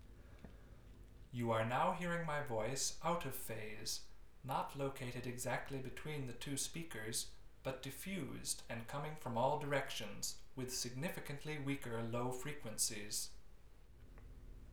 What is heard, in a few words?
Test Signal